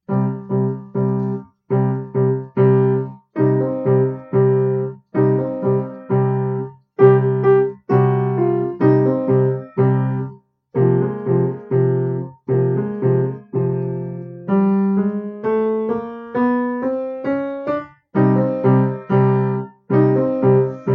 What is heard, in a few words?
creepy crawly blues